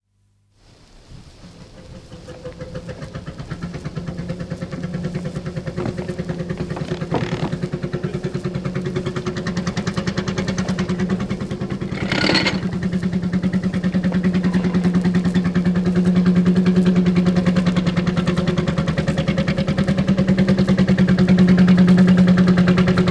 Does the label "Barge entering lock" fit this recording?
Yes